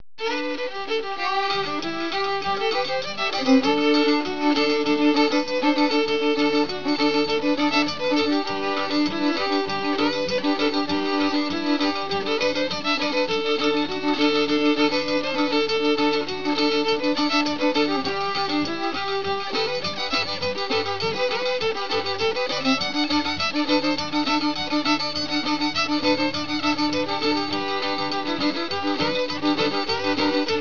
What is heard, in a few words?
galop